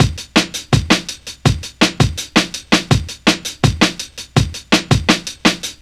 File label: 165, drum loops, drum & bass